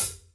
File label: H.H.C, STUDIO STEREO HI HAT